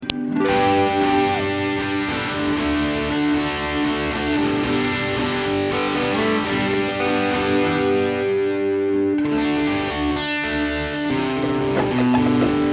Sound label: Ambient Guitar Mix